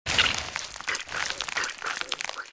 Zombies eating

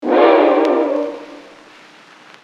French horns rip shakes on vinyl

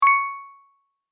Friendly digital ding app alert

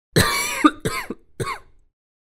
Middle aged man cough

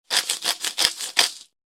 Salt grinder twist crunch; cooking

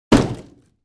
Simple wood hit impact

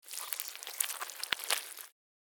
Worm slithering movement short; slug and worm

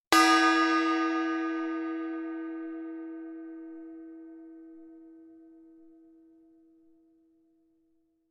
Single gong hit

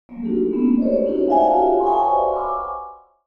Old vintage cartoon flashback

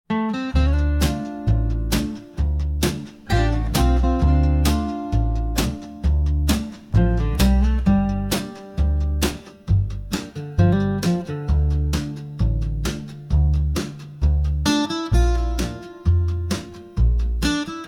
Modern indie acoustic hopeful

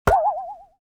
Cartoon accent impact boing

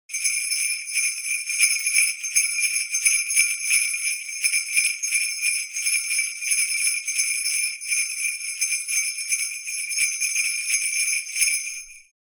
Sleigh bell christmas jingle bell ringing

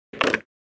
Pool ball landing into the pocket close

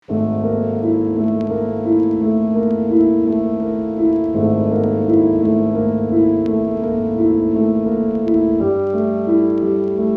Old creepy piano recording on vinyl

